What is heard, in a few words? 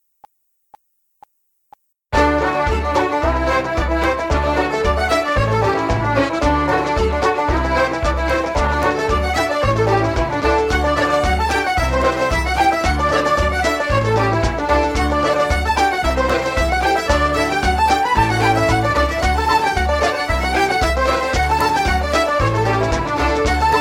Irish Ceili Reels Medley D, backing track